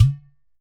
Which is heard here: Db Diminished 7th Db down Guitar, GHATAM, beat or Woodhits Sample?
GHATAM